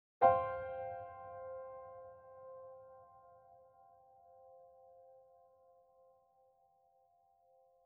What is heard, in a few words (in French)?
accord piano; clef de sol